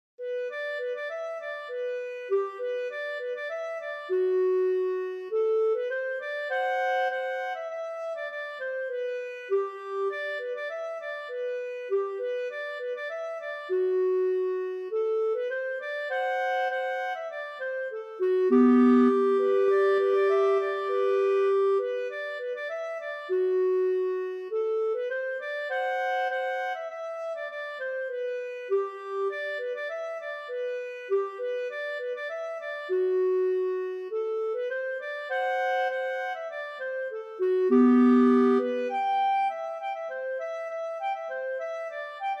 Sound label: polkett mazurka; Bertils